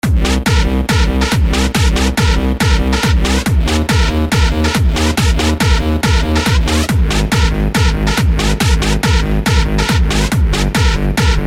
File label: hardstyle